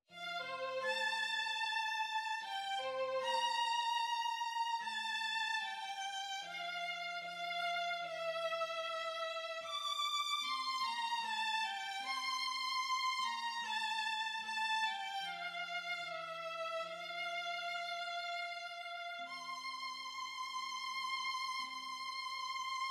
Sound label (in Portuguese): Violino solo, Dona Nobis Pacem